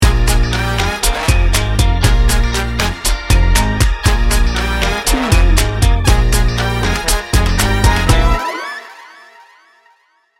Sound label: cool kids sting bumper